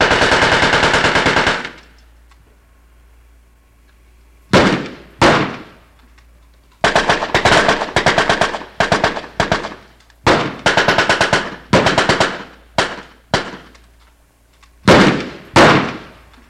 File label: Machine Gun Rapid Burst; bursts